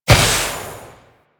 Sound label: Vial Gas Shatter; Warframe; DE; Warframes; Alchemist; Elements; Ability